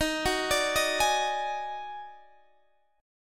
Eb Minor Major 7th Double Flat 5th Guitar Standard, chord; JGuitar